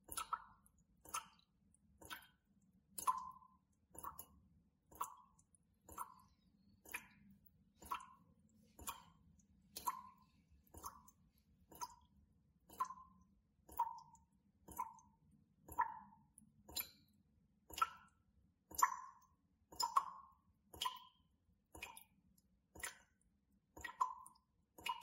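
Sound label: kapli vody iz krana, water drops from the tap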